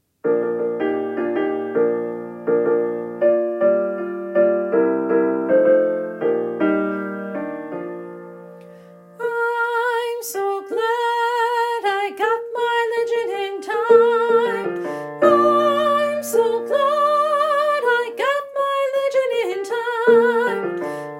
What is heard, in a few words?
Hush solo, youth choir